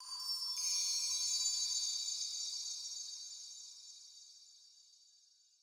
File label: divine bridge spawn; action; subtle